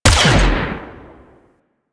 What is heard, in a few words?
Shape Blast Shotgun Fast Fire; Warframe; Weapons; Corpus